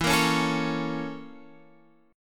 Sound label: A sharp Minor Major 7th Flat 5th